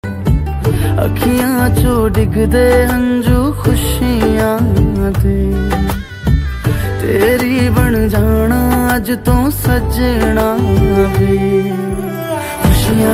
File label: ringtone